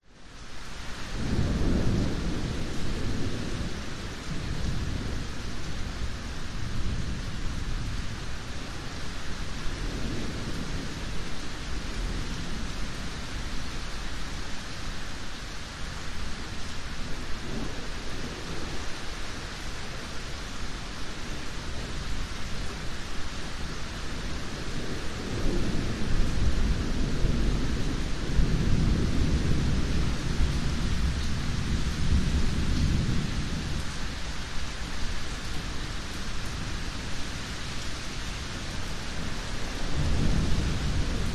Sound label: nature thunderstorm long rumbles thunder rain wind pick up just after halfway; yard